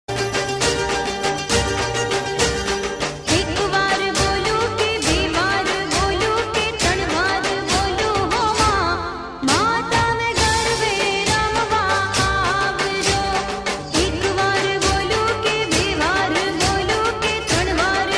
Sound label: Garba